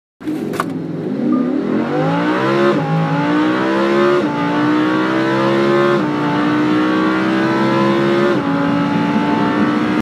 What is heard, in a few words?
lamborghini huracan sound